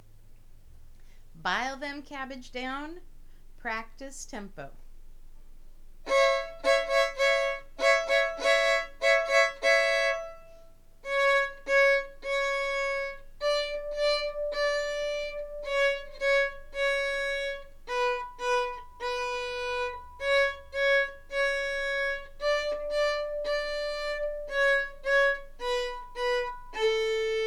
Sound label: Bile em Cabbage Down in A practice tempo